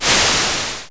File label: Automatic door